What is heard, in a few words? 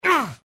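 take damage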